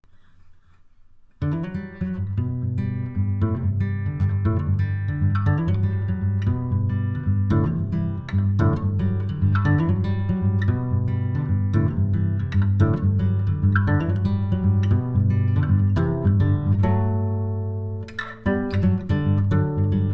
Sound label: ding ding ding